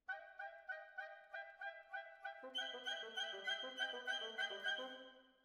Violas Over

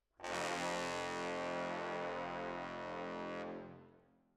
Decca, Jazz for piano

